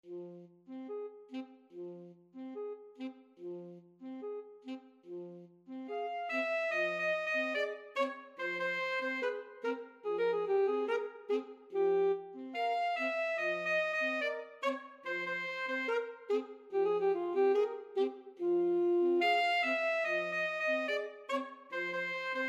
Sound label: habanera, alto saxophone duet